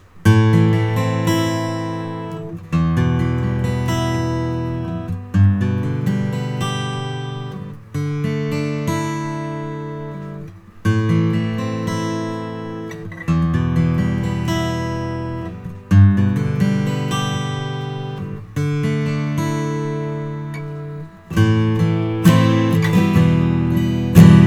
martin m 30

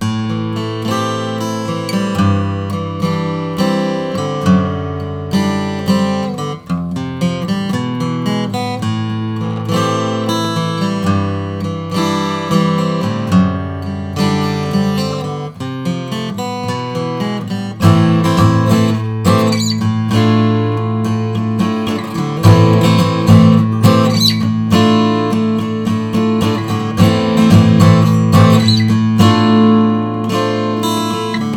McPherson MG 4 0 XP bear claw

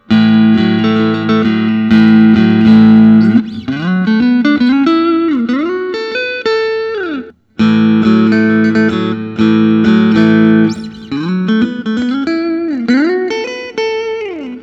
fender custom shop limited 67 tel

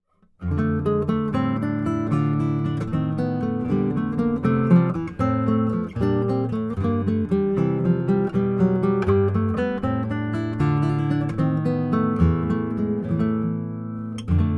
jochen rothel classical

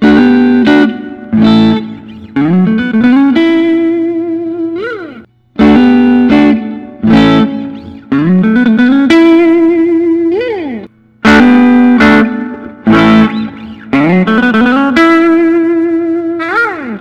tom anderson short t classic

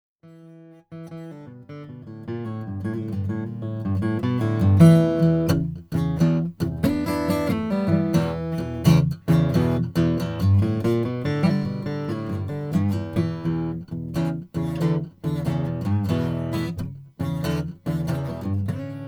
collings d3sb sunburst

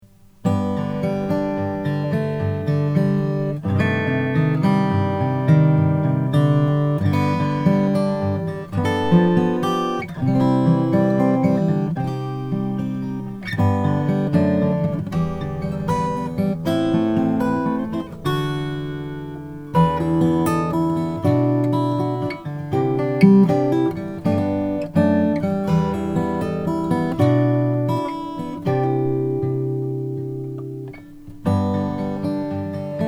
Langejans rgc 6 with 1 13 16 indian rose

